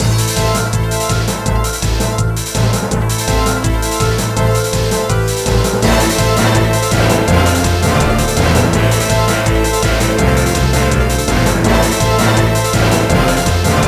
Boss Fight Orch Hit; Kim Possible Global Gemini

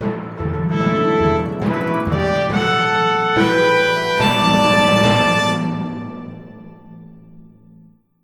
Win Game; Fairy Godmother Tycoon

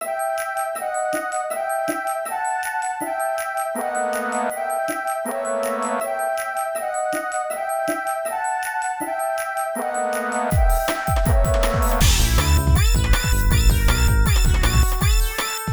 Stage BGM

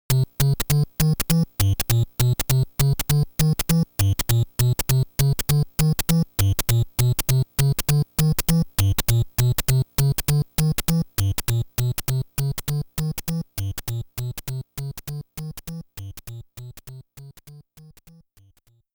Slot BGM - Nervous Breakdown BGM; Famicom Sound History Series Mario the Music

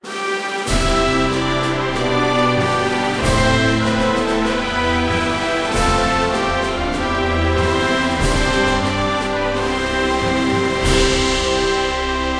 Russian - Win; Battlefield 2 windows gamerip 2005

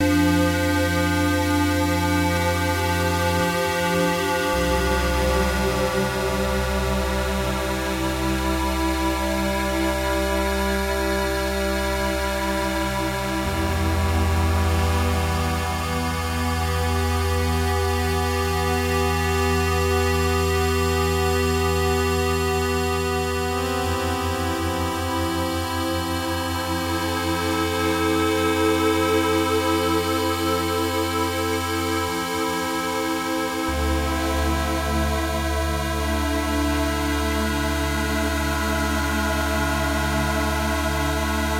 Cathedral Drone Loop; super time force ultra

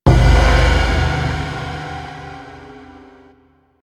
Stinger Fail; StarCraft 2 Wings of Liberty

